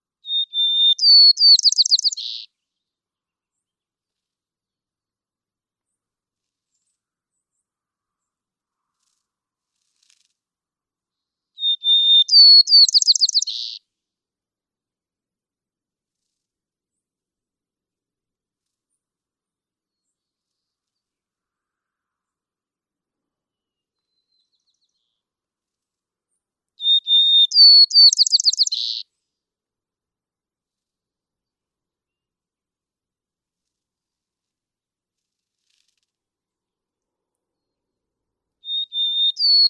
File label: White-crowned Sparrow